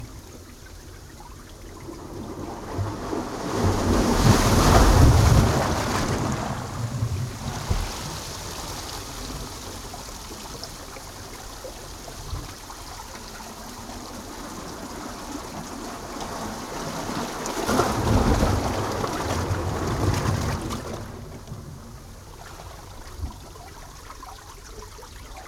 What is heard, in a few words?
Waves Break; Nature Relax Sounds